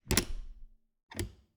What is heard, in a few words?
Refridgerator, old fashioned, wooden, steel, brass clasps, hinges, close open; 2 mics; Eiravaein Works; Vaeyan IV